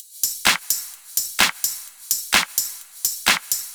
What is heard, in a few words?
Percussion Loop 128 BPM